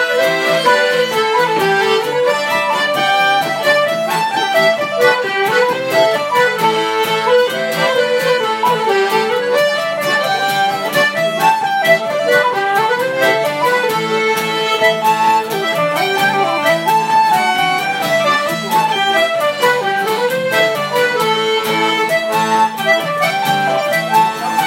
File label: Polka